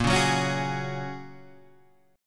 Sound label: Ab 7th Suspended 2nd Suspended 4th, chord